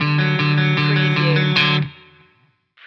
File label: free flowing stinger